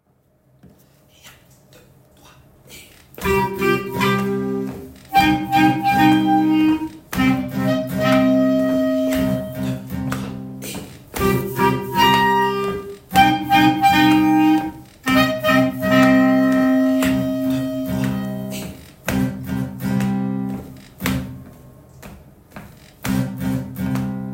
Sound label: Rondo II accompagnement